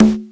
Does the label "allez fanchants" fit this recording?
No